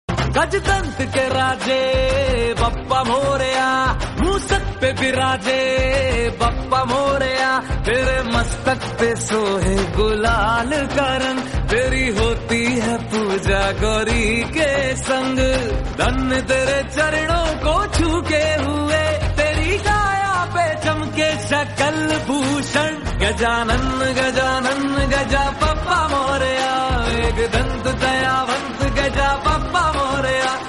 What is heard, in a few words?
Devotional Hindi; Gajanan